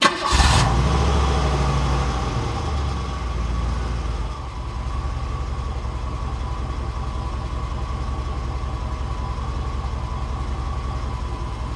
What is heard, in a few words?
Cadillac 1990 Exterior Start, Idle, Away Very Slow, From Rear